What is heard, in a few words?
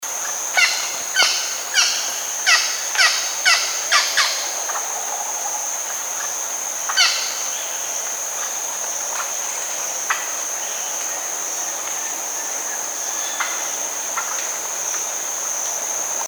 caica parrot